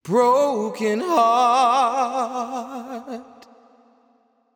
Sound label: Sung Phrase Wet - 80 BPM B Maj, Vocal; Broken Heart; Cymatics; Euphoria Vocal Sample Pack